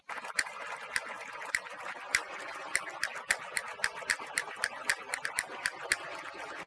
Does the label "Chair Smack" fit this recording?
No